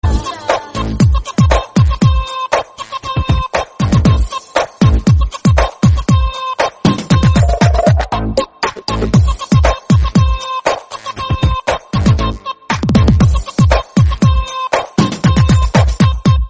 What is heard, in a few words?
telugu ringtone